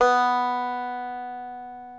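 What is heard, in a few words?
reference pitch banjo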